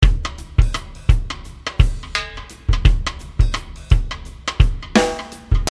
Jazz Shuffle

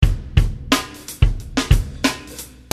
Dream Hop

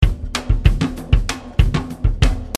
Eight Patterns

